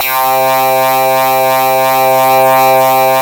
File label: MS20, LEADS; Masterbits; Vintage Voltage